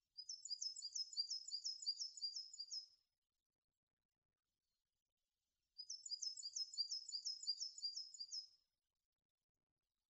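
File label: coal tit song; higara saezuri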